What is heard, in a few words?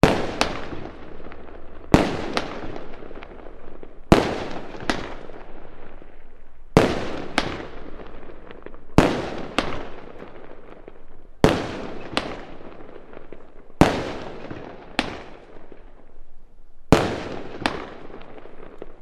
fireworks multiple explosions